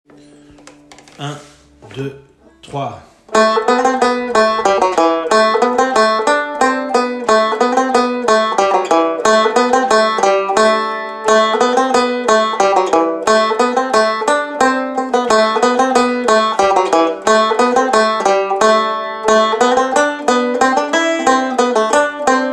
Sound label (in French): Hanter Dro, musique